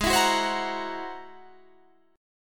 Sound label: G sharp Augmented 9th G sharp